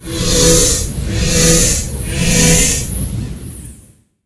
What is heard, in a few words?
weapon speed; Earth and Beyond; EA Games